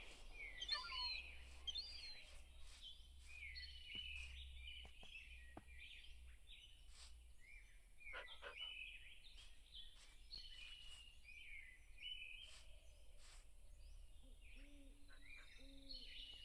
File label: Ambient; dust minecraft